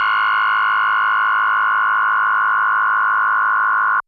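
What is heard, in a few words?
DX100 D#0; rocket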